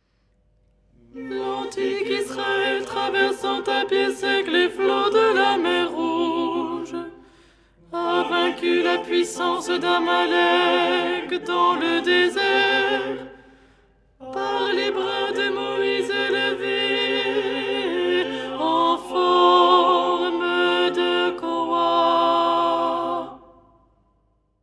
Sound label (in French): alto; 1er hirmos du canon du dimanche, 1ère mélodie bis (petite variante à la fin).; Les 8 tons en français; ton 4